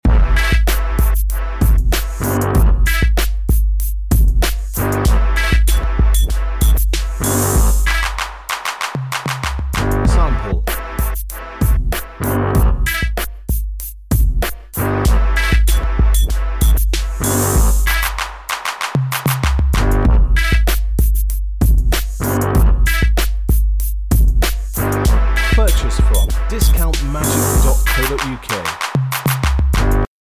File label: hip hop